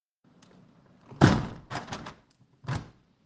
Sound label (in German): Fenster schliessen